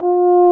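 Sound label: brass baritone; Roland - LA Composer vol. 1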